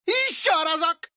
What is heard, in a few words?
ogre; tasty